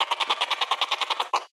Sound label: laugh; CrossCode; battle; hillkat